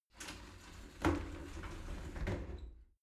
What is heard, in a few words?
shower slide door close soft